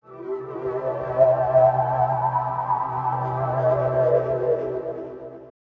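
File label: Pad Synth; Equipped Music; Loungin House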